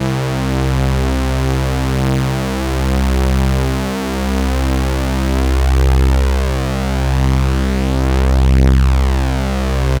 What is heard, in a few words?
Synth, Raw Analog Multi's; Dream Zone